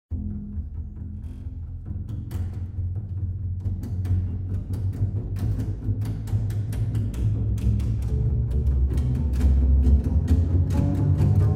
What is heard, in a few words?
Metal Song Part